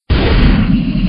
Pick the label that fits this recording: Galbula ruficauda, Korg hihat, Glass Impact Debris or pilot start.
pilot start